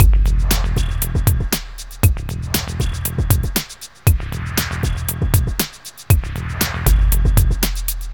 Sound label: Elektro, Processed Percussion; Sonic Foundry (Sony Creative Software) - Rhythmicronics Processed Percussion